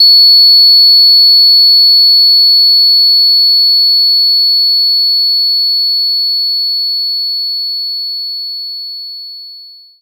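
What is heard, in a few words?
Ocarina F#6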